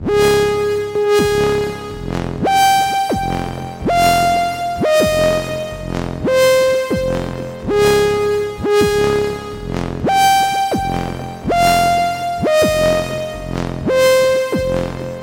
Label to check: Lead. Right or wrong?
Right